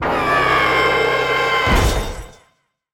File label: mystical death